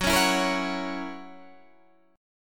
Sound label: Gb 7th Gb down Guitar Standard, chord